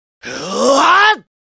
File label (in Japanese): 「はぁー！」; スキル（レリックオブライトニングEX）